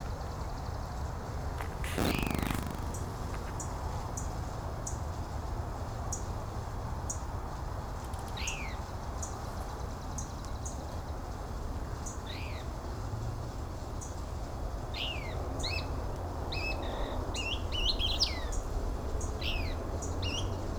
couchs kingbird, texas

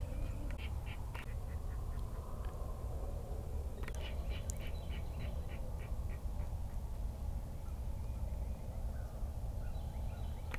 virginia rail

